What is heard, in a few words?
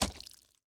shallow water, walking; factorio 2.0.55